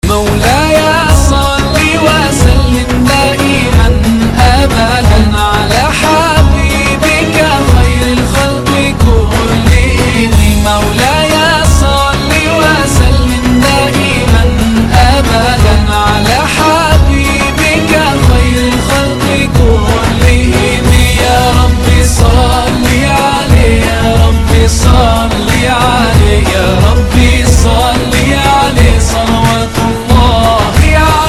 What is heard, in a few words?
(Arabic Version - Bonus Track)